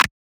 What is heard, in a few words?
success